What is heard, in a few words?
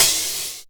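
open hi-hat, Dance Synths, Percussion; Mad Kit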